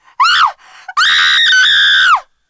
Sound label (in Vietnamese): Tieng phu nu la het khi bi bat